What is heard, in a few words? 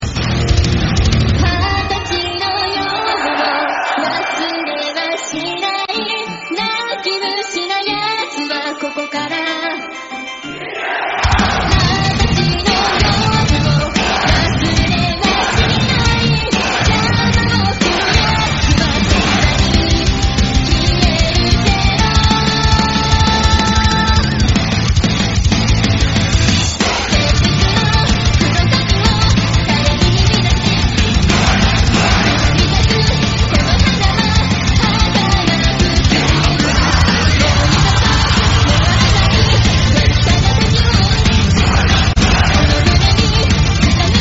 metal solo headbanger live